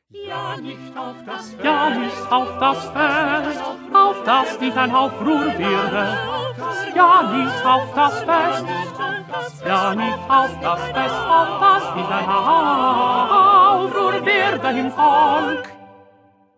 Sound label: 2nd Tenor